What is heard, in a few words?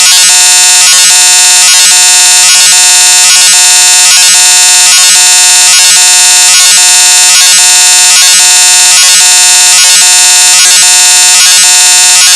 tacs cell broadcast